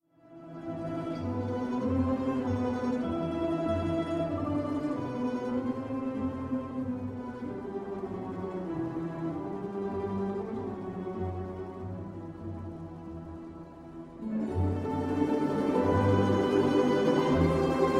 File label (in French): balalaika, cordes pincées